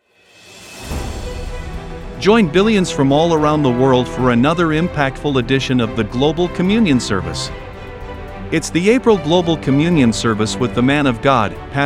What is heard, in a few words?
Jingle